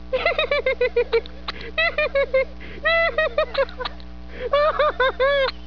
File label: Giggles; Homer